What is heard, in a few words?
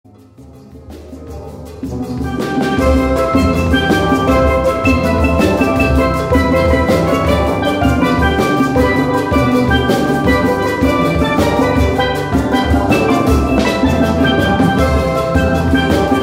Rhumba Medley, steelband